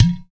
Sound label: UDU; Spectrasonics; Heart of Africa